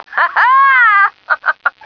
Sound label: hehehaaa; stars; taunts